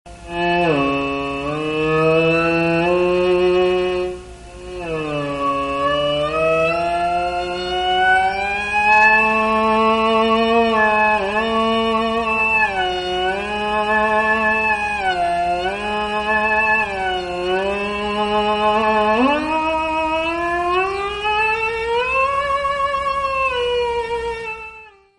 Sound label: cat fiddle, theremin